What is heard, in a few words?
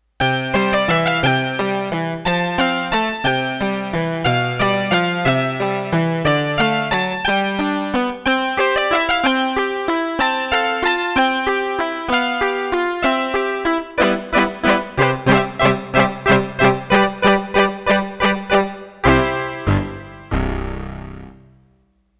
Rich Harpsichord, Roland D-10; Factory Patches